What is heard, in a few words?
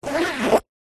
foley luggage bag fabric small zipper short; zapsplat